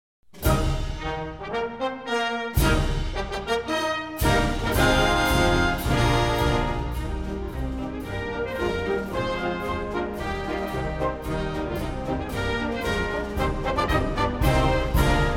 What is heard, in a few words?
RAF March, Wind Orchestra